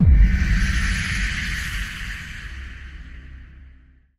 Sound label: boom